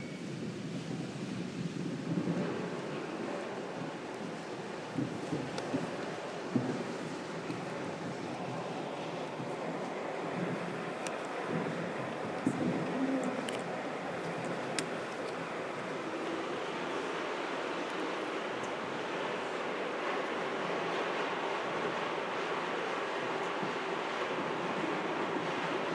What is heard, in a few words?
more rain at the drumming workshop